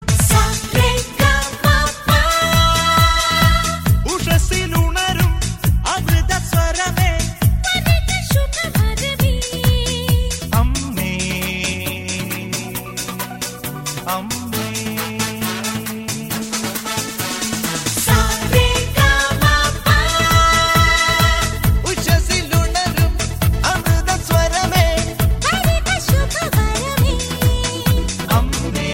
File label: malayalam, ringtone